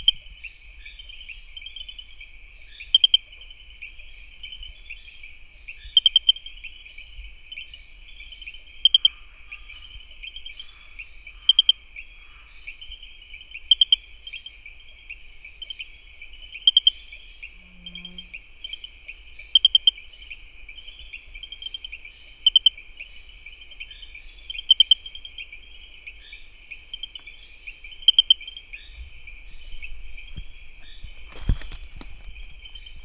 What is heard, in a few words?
Pristimantis espedeus